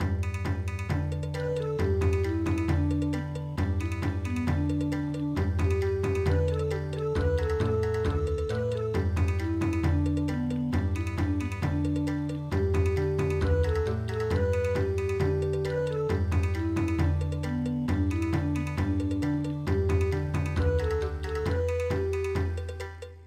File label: Bon Odori, midi